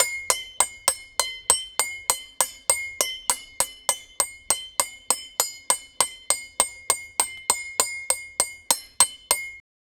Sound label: blacksmith hammering loop